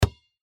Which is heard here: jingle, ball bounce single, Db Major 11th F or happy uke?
ball bounce single